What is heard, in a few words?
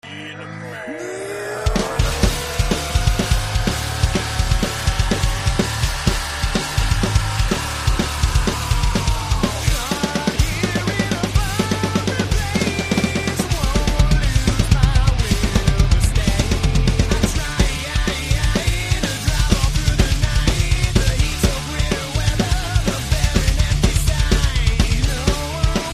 drum